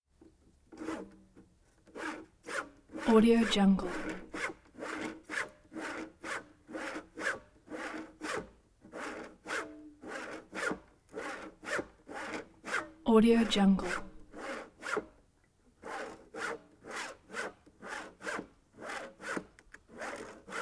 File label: sawing exterior slow cutting wood; prosoundfx